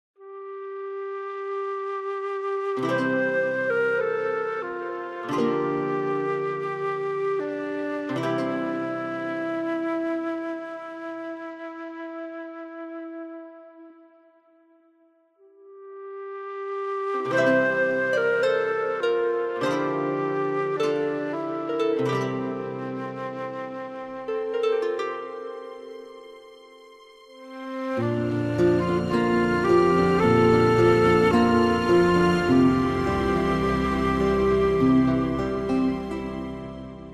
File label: Atmosphere